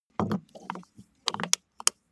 car bmw e46 exterior gas cap spin close

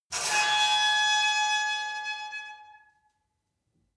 metal squeal saturated; industrie-wirtschaft

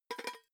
pot lid wiggle short; objekte; topf

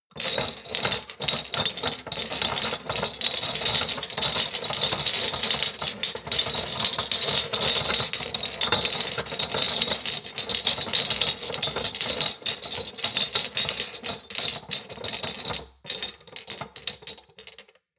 shot glasses rattle medium; berufe; gastronomie; soundpool

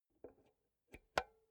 insert xlr multi socket slow, elektronik; objekte

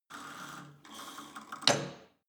cable machine old pull and drop sequence hard; sport freizeit

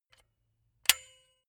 silicone gun bullet lock mechanism heavy; soundpool

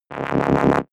sci-fi scanner wobble low

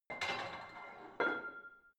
barbell plate put on hit ring soft; sport freizeit; fitnessstudio

